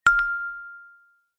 multimedia alert notification ui ping chime mallet like; zapsplat